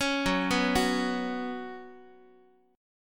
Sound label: F sharp Suspended 4th, Guitar; arpeggiated; F#sus4